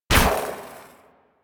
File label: Amp Barrel Shot, Weapons; Warframe; Operators; Amplifiers; Amp Set 2